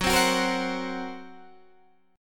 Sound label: Gb Major Flat 5th, down, Guitar, DADGAD